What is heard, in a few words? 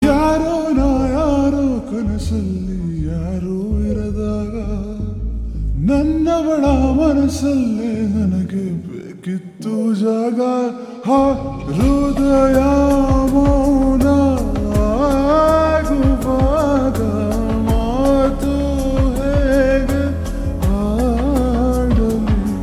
Slow Reverb Version Ringtone, kannada